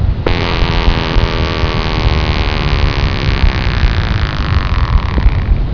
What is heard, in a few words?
alarm